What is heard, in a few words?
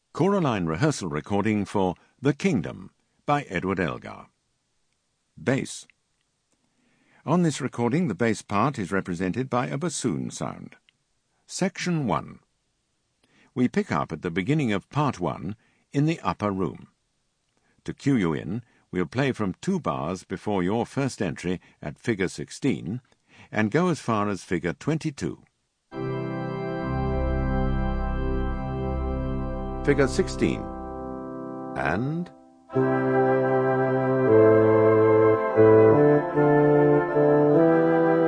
Bass; Elgar Kingdom